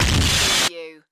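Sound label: Production Element Hit Distort; distortion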